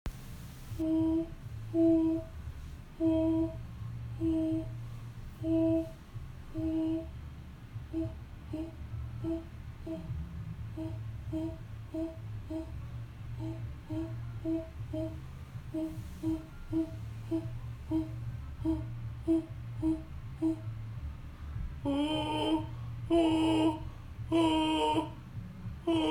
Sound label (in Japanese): 吸気 裏声